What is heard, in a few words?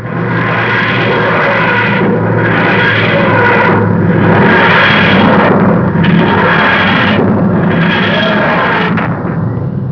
Tardis